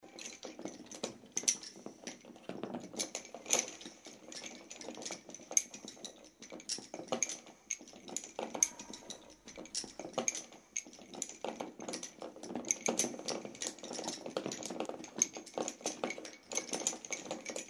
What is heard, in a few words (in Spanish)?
palillando